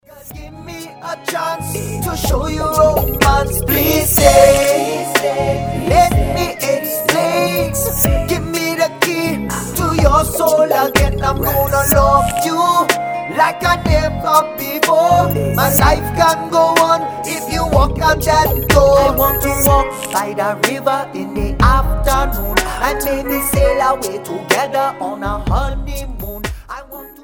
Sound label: reggae ballad